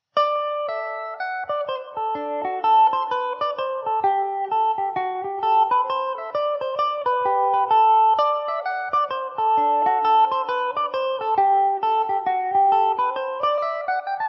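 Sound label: G major mandobird, irish mandolin; The Leap Of Faith; Epiphone Mandobird VIII electric mandolin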